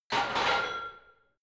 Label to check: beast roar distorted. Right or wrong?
Wrong